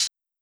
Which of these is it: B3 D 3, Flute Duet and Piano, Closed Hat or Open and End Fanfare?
Closed Hat